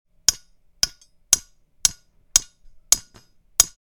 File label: Metal hammer hitting metal panel